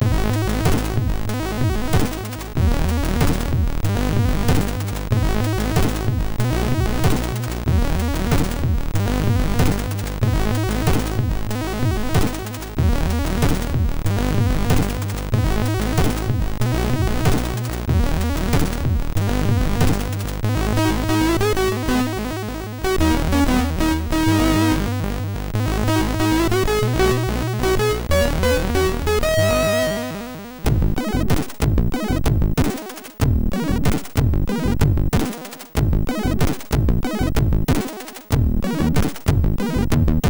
sid, MOS6581; hvsc

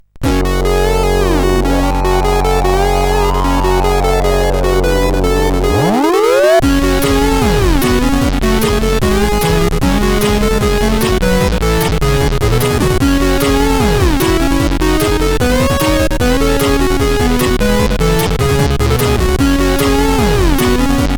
Metal Ascender, CSG8580R5

